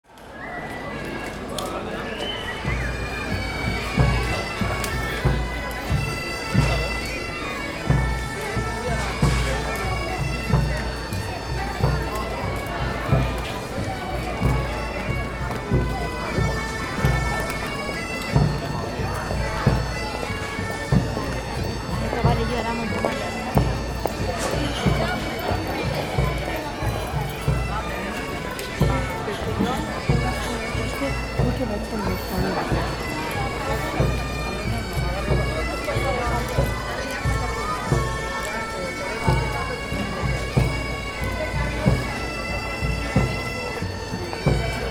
City ambience street parade Scotland

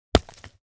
Rock impact on dirt and gravel single hit

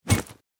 Grenade throw launch whoosh; Military Equipment

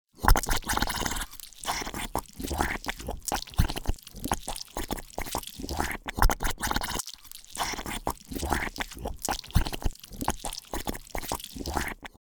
Cartoon accent slimy bubbling air releasing

